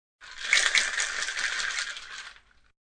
Bartender shakes ice and drink in metal ice shaker; Drink Mixing; Bars